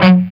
G 4, Soldano rock guitar; Spectrasonics; Hans Zimmer Guitars VOL-1

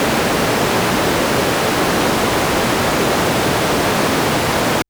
Modular Raw, White Noise Raw; noise hiss crackle

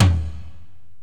Korg x5, Drums

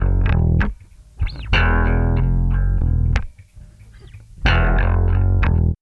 BASS, Loops; East West; Funky Ass Loops

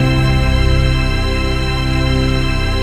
PAD, Dance Mega Synth

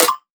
dnb snare

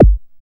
BD ANALOG; Sound & Vision - Gigapack II CD 2

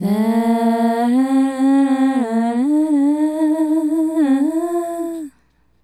Female Group, Hip-Hop and RnB Vocals

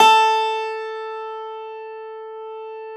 Pop Piano ff -ped, A-1; Classical Prepared Pianos; Total Piano

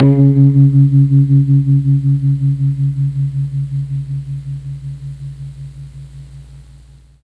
C#2 GUITAR, Mellotron MKII; Mike Pinder - Mellotron Archive CD

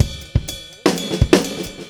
Beat Loops; Zero-G; Monster Beats

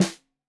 Snare 65 Reg Stereo, Deeply Sampled Drums

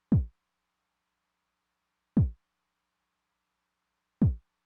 kick drum, Roland TR-909; Roland TR-909 Ableton Project

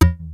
SPLIT; Rubber Chicken Software Sample Bank

